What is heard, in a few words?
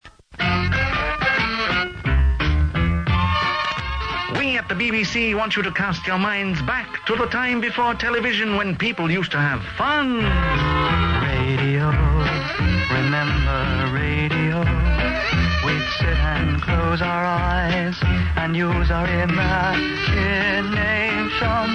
remember radio song